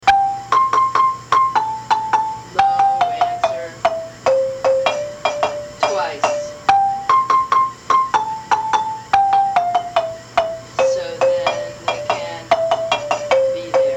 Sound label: lead segue, marimba; manhanga